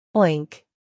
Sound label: oink